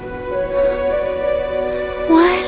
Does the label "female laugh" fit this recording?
Yes